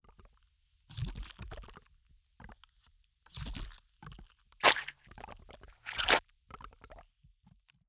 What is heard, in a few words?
idle, monster